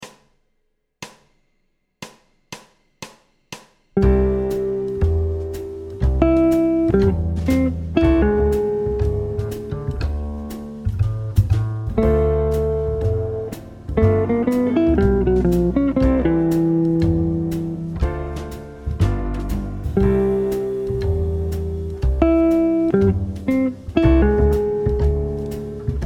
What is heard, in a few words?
grave 120bpm